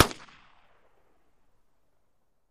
Gun Shot Sngl Fire

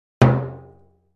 Metal 50 Gallon Drum, barrels buckets & cans

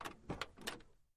Camaro Door Open

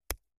finger break, body

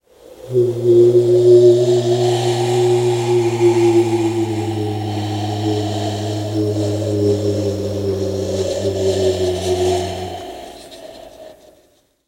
Ghost Cry Supernatural Whooshes; ghosts & demons

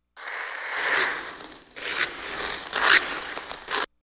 Hockey Player Skate

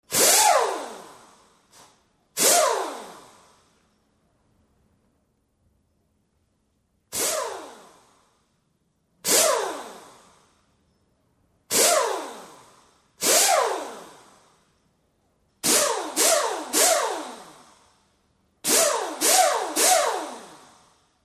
Pneumatic Drill Spur

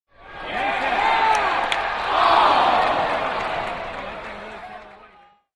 Medium Baseball Crow

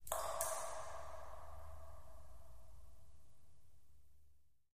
Echoey Cave Drips Wa

